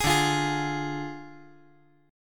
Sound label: D Diminished D